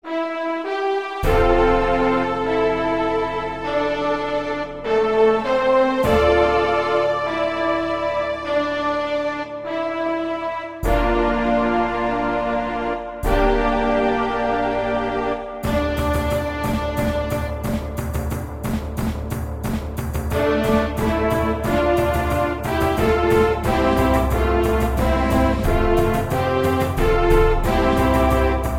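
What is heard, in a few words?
Strings, Brass, Choir, Flute; VST; Pirates Of The Caribbean; Magnus Choir; Syntheway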